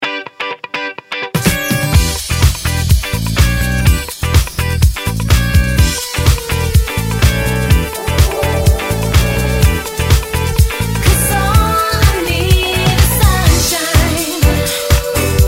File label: Advert Music; ColgateOralCare; Toothpaste; MaxWhiteOne; TV; Commercial